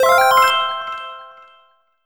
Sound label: Game Win; merge military